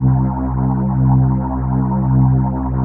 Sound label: PHASE PAD, ATMO PADS; Best Service; XX Large